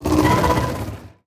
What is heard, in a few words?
worm roar, creatures